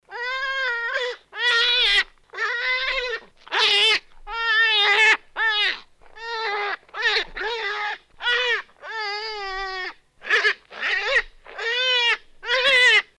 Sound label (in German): feldhase